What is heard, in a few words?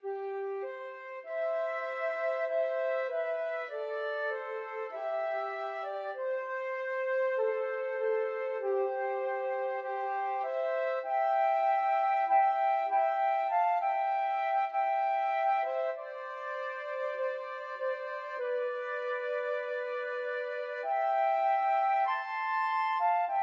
were you there flute duet